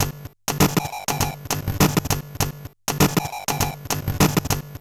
Bent RX17 (100BPM), Circuit Bent; glitch

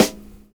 SD, Drums Dance & Groove; USB Soundscan vol.11; AKAI; NYORK KIT

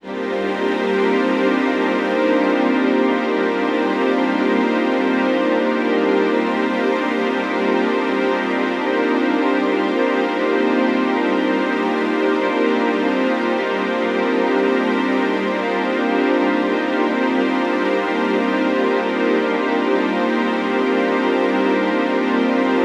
D&B Pad; neuro

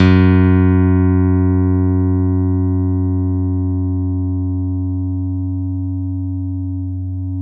F#2 FF, Piano cp80

